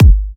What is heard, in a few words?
Kicks Electro